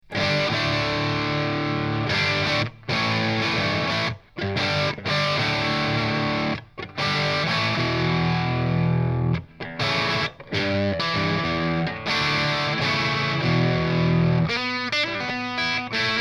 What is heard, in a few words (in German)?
Koch TT II volle Zerre Creamback